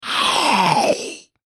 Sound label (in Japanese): クリーチャー ステレオ